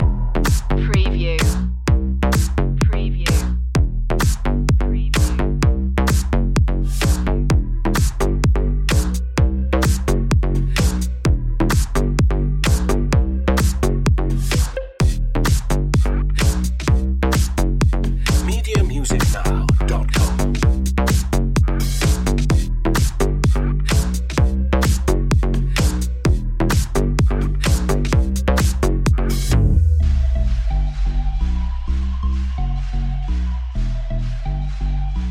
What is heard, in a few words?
icy biatch long looping